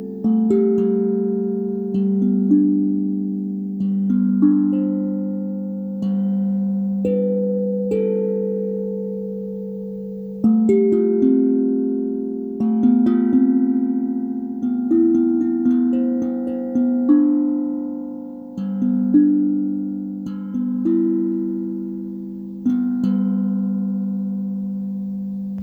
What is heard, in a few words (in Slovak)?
modry, kovovy buben meditacni modry